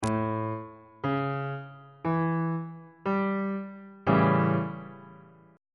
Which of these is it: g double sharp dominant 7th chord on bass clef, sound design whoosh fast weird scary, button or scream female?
g double sharp dominant 7th chord on bass clef